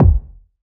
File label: BD, BASS DRUMS; EdgeSounds